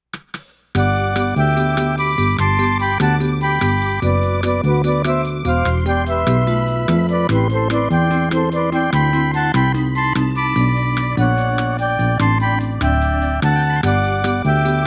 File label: Heartbeat Bossa Nova; Ganbare Goemon Neo Momoyama Bakufu no Odori